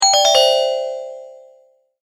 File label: multimedia tone alert; Tones; Sound Design